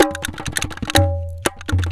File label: KARTH, Indian Traditions; USB Soundscan vol.45; AKAI